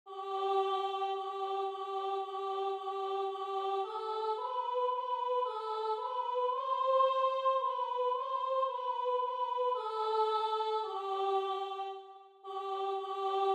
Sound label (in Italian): Soprano; Alleluia (Messa del Papa); Celebrazioni Eucaristiche